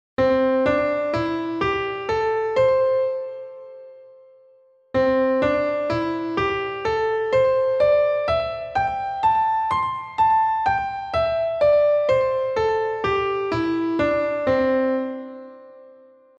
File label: C Major Penta